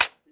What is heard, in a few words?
Hit; Macworld (1999-01); Real Pool